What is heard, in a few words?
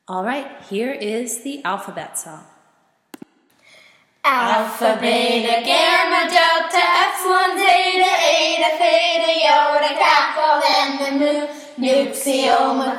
The Greek Alphabet Song